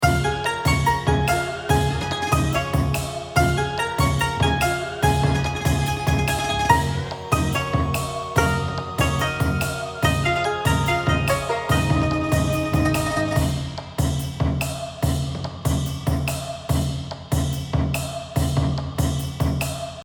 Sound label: background music; Super For